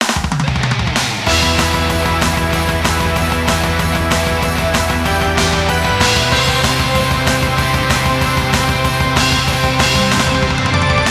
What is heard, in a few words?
Stars bpm 190